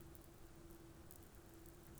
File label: pulses, BALAENOPTERA; MONACO 2022-07